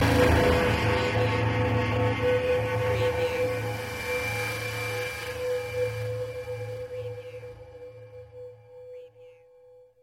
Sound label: Scare Piano and Metal Stinger